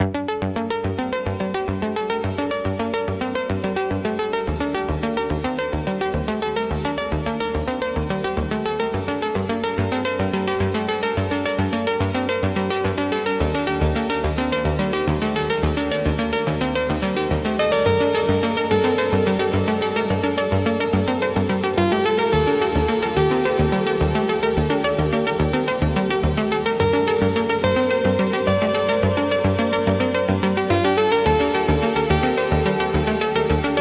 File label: Electronic Impulse